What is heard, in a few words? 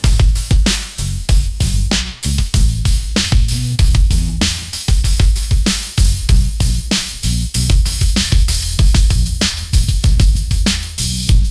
groove machine funk jam, ringtones